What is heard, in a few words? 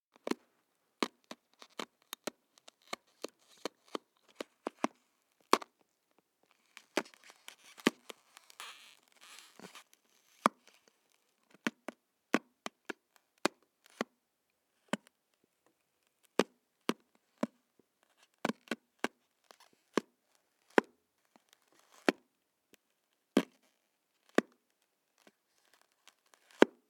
Skull Cracking